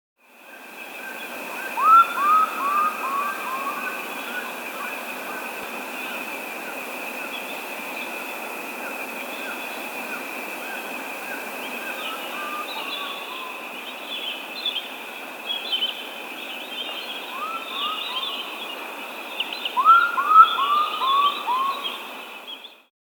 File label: Rufous-Bellied Nighthawk (Lurocalis Rufiventris), Andean Birds; S. America